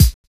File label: kick, Drum and Bass; EZ Rollers; Instrument Samples